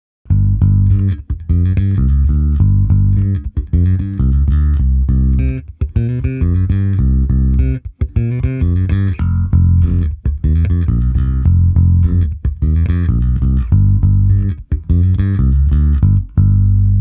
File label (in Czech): Paralelní zapojení cívek 1 a 2 – použil jsem i zkreslení a slap; Ernie Ball Music Man BIG-AL